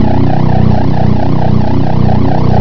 pod eng; dosgames; brr; buggy

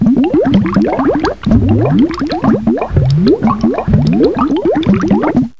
BUBBLING; Professor Multimedia

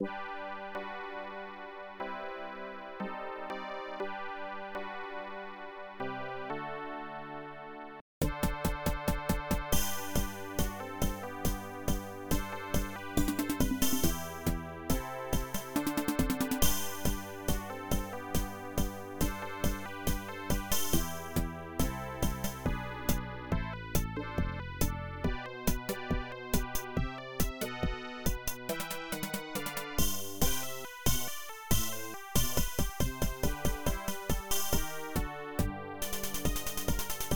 MACWDRUM

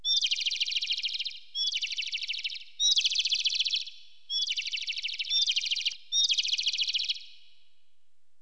Birds

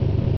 engine, tank

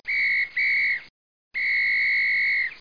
whistle; 12000 Graphics Pack; Media Graphics

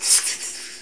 Spider Hit; Curse of Dragor

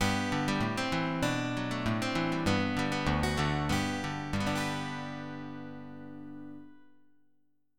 stl guitr

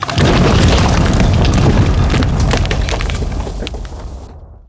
statue fall; DAoC; Toa; Gamestar

